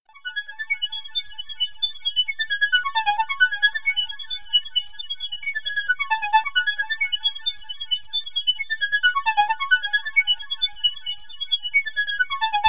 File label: Juno 60 Arpeggio